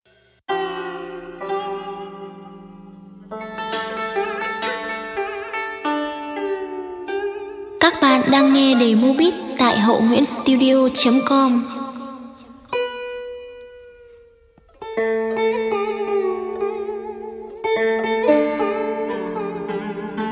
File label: beat, playback